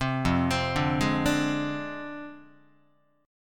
F Minor 6th F down Guitar; Fm6; arpeggiated; 3,1,3,0,0,1; JGuitar

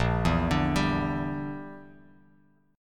Bb 6th Flat 5th Bb, Bass Standard; Bb6b5 arpeggiated

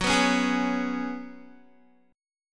Gb Suspended 2nd Suspended 4th, Guitar; Gbsus2sus4 strummed; 14,14,11,13,11,x; 4E,4A,5D,5G,5C,6F

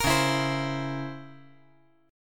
Db Minor Major 13th Db; DbmM13 strummed; JGuitar

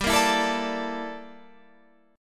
G Minor 6th Add 9th, Guitar, Open F; Gm6add9; strummed; 19,17,16,16,17,16

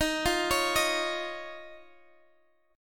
Eb 7th Suspended 2nd Eb, Guitar Half Step Down

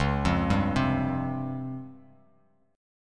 C sharp Major 7th Flat 5th Bass Standard; C#M7b5 arpeggiated; 9,8,5,5; JGuitar

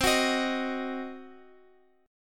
C Diminished C, Guitar Open F; Cdim strummed

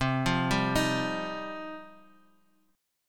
F 7th Suspended 2nd C

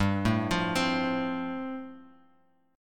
G 7th Suspended 2nd Suspended 4th, Bass Standard; G7sus2sus4 arpeggiated

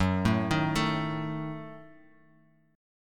D sharp Diminished F sharp, chord

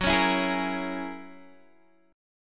F sharp 9th, Guitar DADGAD

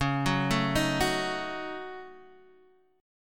Eb Minor 7th Flat 5th Db, guitar chord

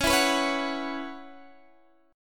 C sharp Minor 11th, Guitar Drop D

